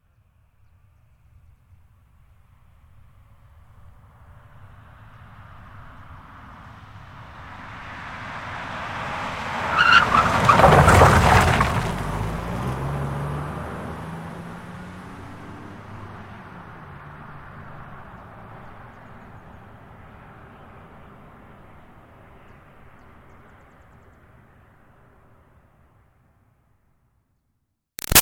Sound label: Car Highlander By Corner Dirt Fast; 2005 Toyota Highlander V6; Cars; Vehicles